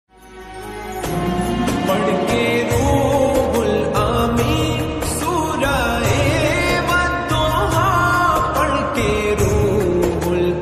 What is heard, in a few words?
islamic ringtone sad violin ringtone naat ringtone sweet song